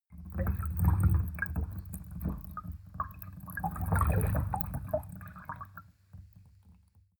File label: bathtub underwater movement